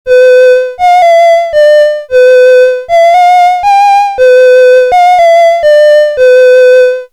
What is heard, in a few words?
Casio VL1 Violin